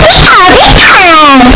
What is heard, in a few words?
pikachu cry; pokeyellow